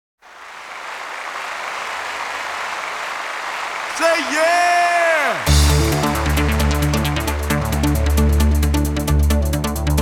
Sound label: Rave Style Club Mix